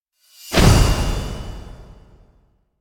Impact Heavy Thin Metal Impact - Single; Hollywood Edge; sound designer's toolkit v2

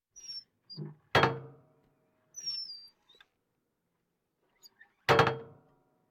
Military vehicles

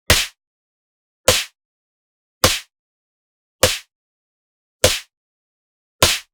PUNCH High Fast Face Slap Clean; boom library; Anime Essentials

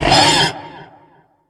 Wisboar Druid Death; Kilta

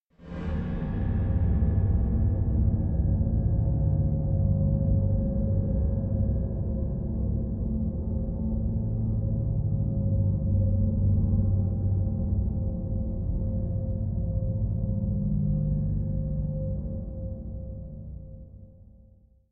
Drone Deep Mystic Moaning Pad; Hollywood Edge; sound designer's toolkit v2

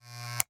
Electronic, Latch, click, heavy, clippers, off; Eiravaein Works; Vaeyan IV

